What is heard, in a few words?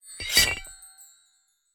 Magical Enhance or Upgrade